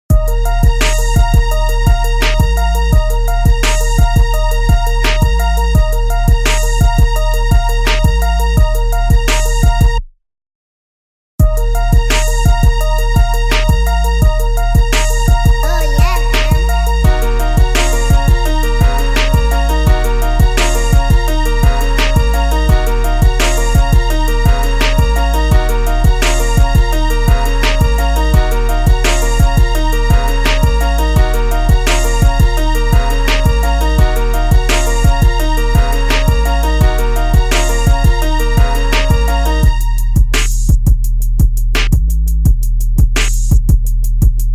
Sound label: Instrumental